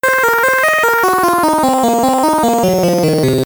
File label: epiano, LSDj Wave Cruncher instrument